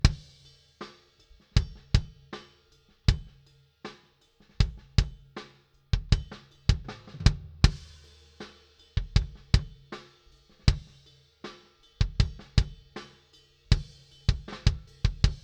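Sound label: Kick Low Bleed debleed OFF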